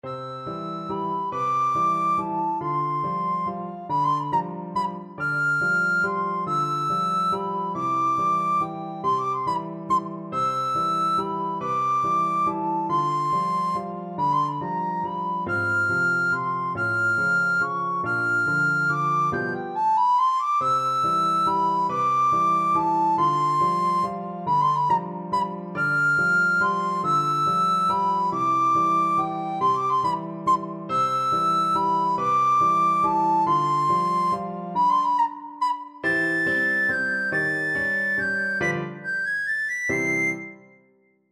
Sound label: waltz, recorder